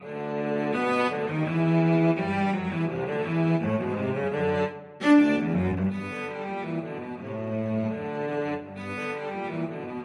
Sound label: euphonic sounds cello duet